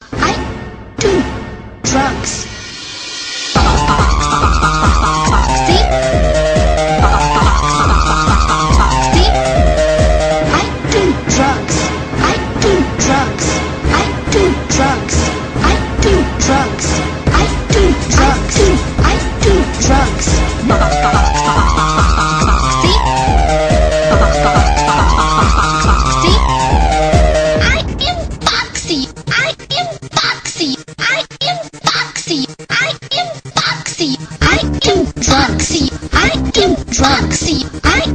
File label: Techno Remix; Music